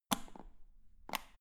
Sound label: power button turn on off hard